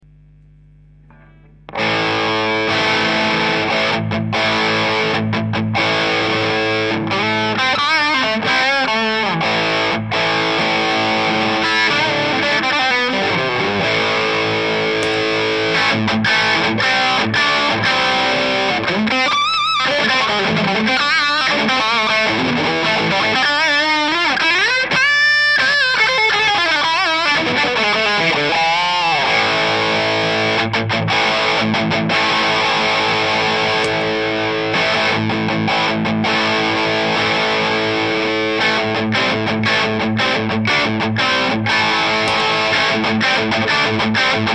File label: driven boost